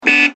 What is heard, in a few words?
signal; TGK2